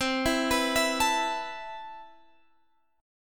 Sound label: C Major 13th C, Guitar Drop D